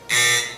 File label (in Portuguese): campainha; campainha do roda roda